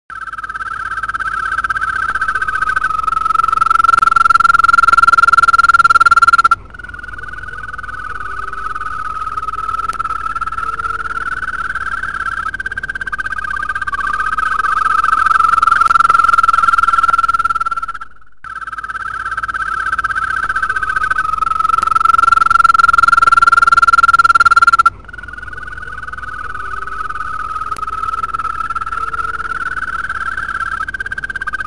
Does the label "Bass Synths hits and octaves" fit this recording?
No